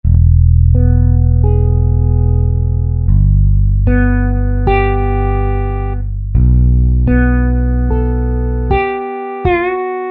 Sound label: Fretless BASS, Roland SRX-07; Roland SRX-07 Ultimate Keys